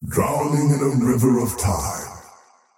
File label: death; dota; faceless void